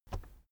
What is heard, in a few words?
keyboard external space push soft